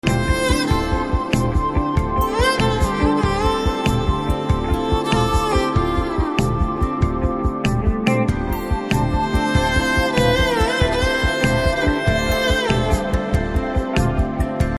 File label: BGM Guitar Ringtone; Jaanu